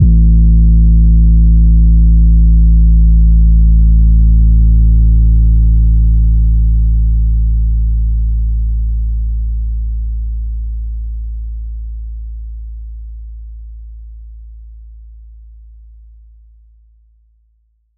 phonk kicks oneshot - 808 a, Specially designed for phonk type beats, these nasty, layered 808 o; kick drum